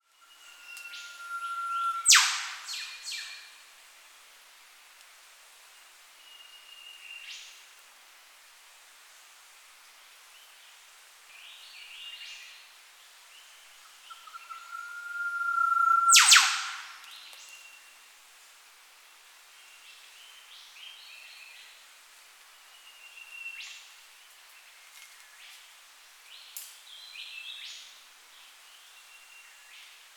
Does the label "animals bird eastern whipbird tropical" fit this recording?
Yes